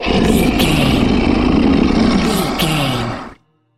troll monster growl long

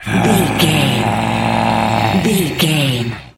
voice male growl

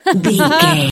voice female expression emote

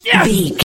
voice male attack

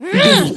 voice female attack

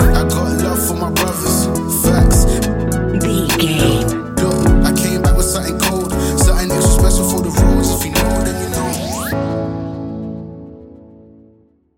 A relaxed and chilling piece of music

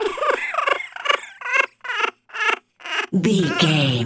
goblin fairy cry low

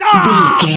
voice male hurt pain

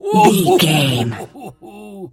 voice male laugh short

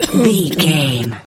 voice female cough

